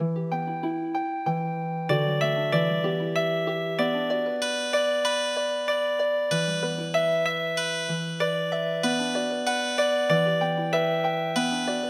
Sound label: Vietnam Boat Song Healing Harp